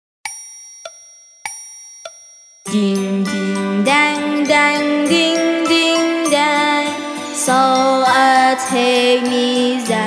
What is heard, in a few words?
in G Vocal; Twinkle Twinkle Little Star